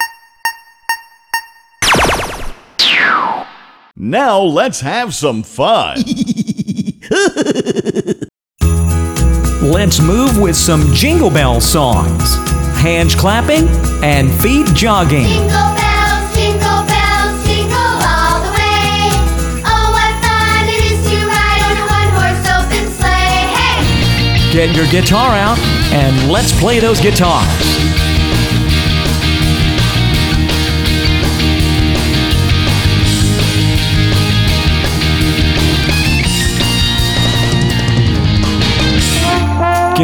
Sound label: Jingle Bell Variety